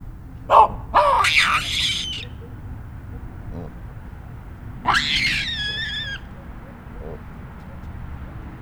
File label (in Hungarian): hangoskodás szélvédő, galléros pávián; állathangok; nyíregyházi zoo 2010